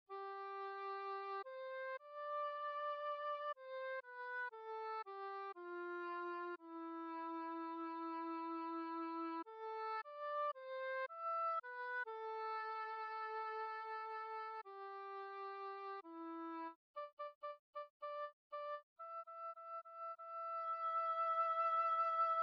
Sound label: oboe pedal